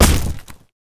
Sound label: land basket, footsteps; player